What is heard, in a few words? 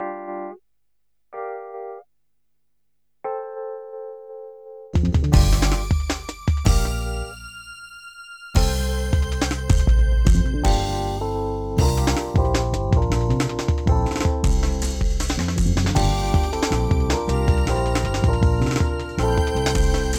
Pinball; pro pinball trilogy 2001 dreamcast gamerip; Road